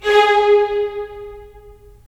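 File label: strings violins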